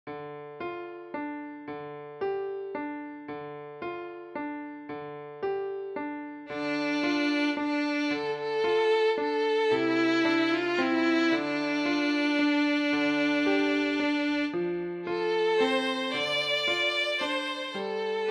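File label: violin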